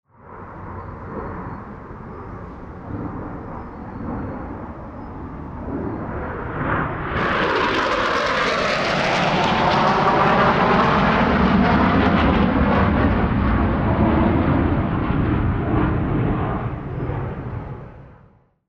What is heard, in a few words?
jet passing